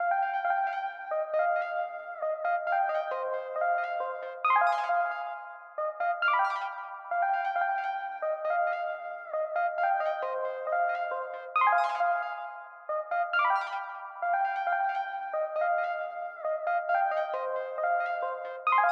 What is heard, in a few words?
135 BPM C Min Prophet Rev2 Plucks; Loop Stems; Cymatics; Fantasy Synth Sample Pack; Fantasy - Tell Me; JU60+OBX+REV2